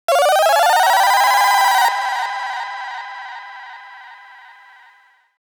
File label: Tech, Weird Side Samples